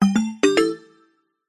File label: multimedia alert mallet and chime positive; zapsplat